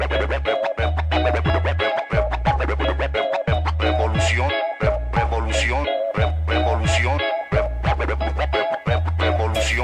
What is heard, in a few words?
Hip Hop